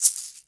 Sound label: Egg, Drum Machines